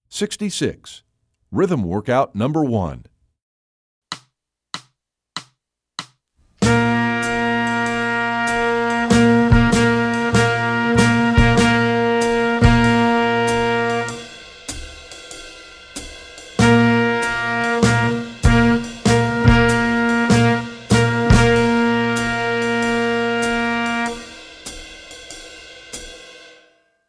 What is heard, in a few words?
rhythm workout